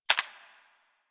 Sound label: SMG Mech; Sundial